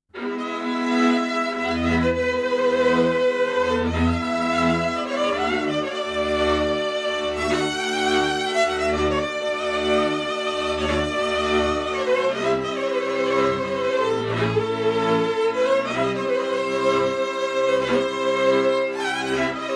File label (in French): roumanie violon